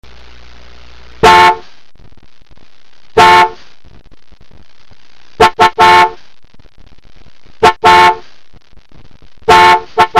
Car Horn

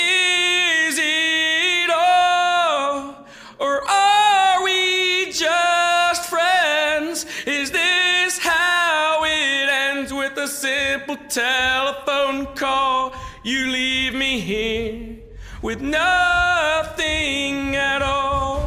Singing

